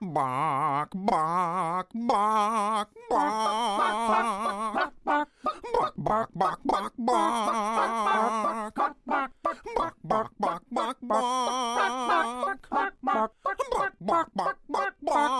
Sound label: Singing